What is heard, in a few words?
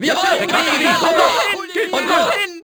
burst, Crowd German